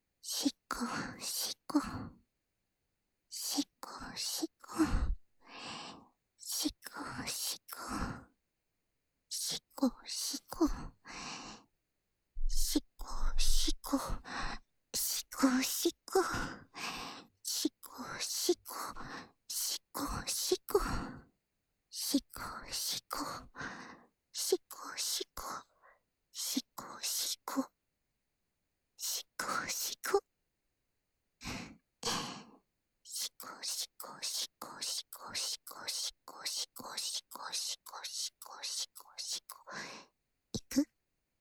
手コキ（しこしこ・右）（SEなし）手交

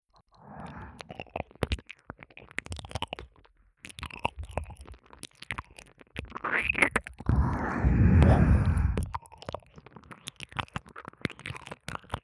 右耳舐めループ; ASMR; 通常耳舐めver

